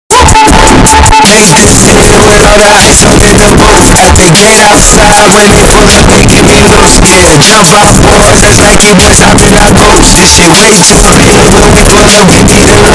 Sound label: hyper distorted